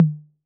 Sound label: Tom Mid B 808, Clean, Digital